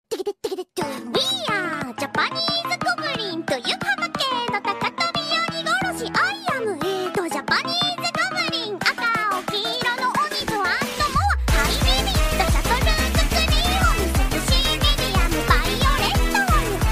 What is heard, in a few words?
Japanese Ringtone